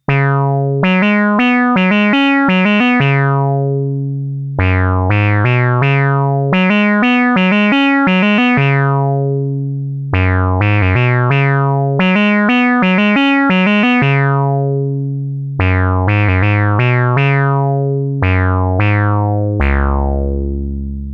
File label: Saw Cutoff 30 Reso 5 Env Follower smooth Cutoff Reso